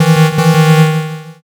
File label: wrong